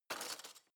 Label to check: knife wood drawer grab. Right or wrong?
Right